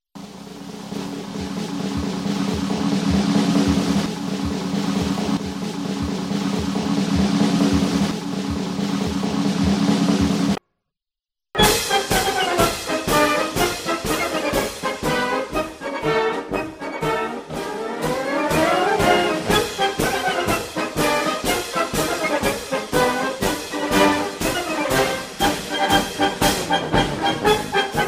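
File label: zvuki barabana, drum sounds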